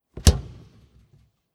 Sideboard mittlere linke tuere oeffnen

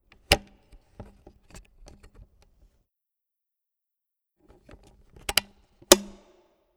Gartenset oeffnen schliessen

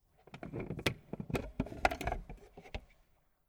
Filmprojektor Tondo Abdeckung ab

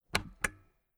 Filmprojektor Tondo Metallhebel

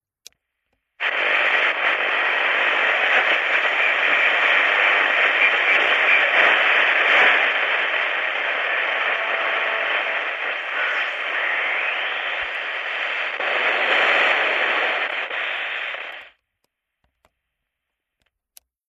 Frequenzwähler Panasonic toot a loop

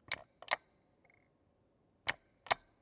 Highscreen Joystick Knopf mitte

